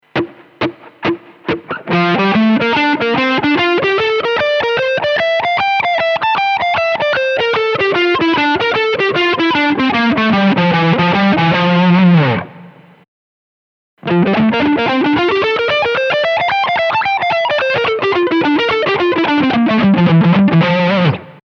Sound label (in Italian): Fa Swing